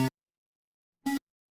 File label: retro game coin